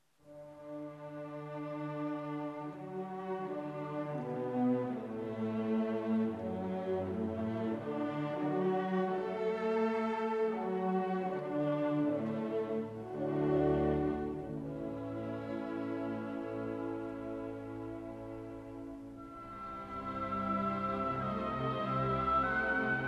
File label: Violin Concerto Allegro